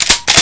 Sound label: shotgun cock; kingpin